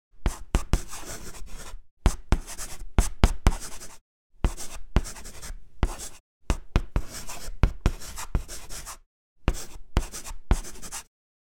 Chalk stick writing on charcoal board

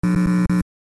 Electrical buzzing burst speaker glitch short

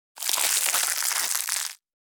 Baby dragon egg hatching

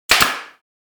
Nail gun single shot

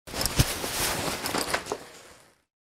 School bag backpack handling movement; luggage

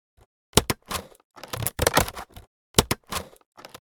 Scissors cutting plastic; gfxsounds

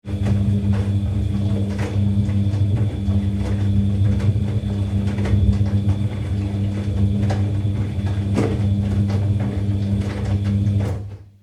Dishwasher running constant; appliances

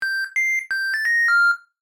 Dishwasher cycle end jingle; appliances

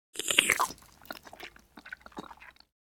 Spaghetti sucking pasta eating

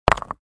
Small rock hit hard ground

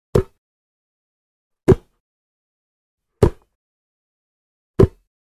Passing and catching rugby ball

